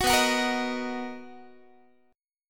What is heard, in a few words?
B Major 7th B down Guitar; strummed